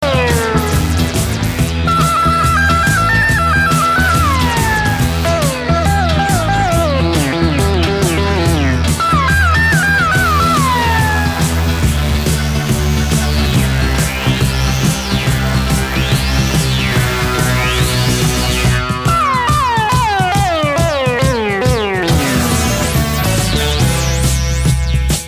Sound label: (instrumental)